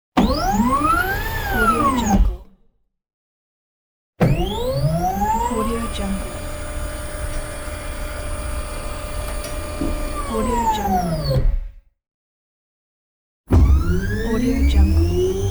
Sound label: large servo motor